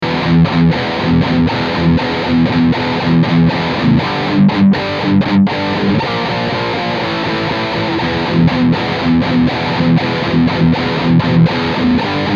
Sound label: BRIDGE drive, line6; eclipse active